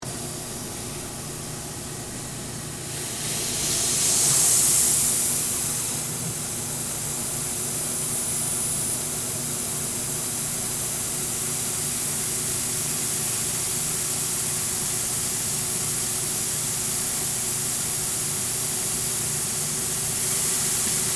Laser Cutter, Industrial, Cutting, Machine, On, Run, Off; Machinery Sound Effects